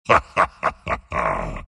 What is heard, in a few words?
laugh, centaur; dota